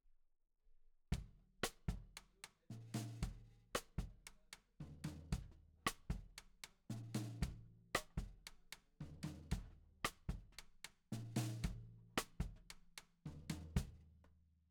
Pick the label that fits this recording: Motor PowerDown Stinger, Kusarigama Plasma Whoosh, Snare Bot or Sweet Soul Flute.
Snare Bot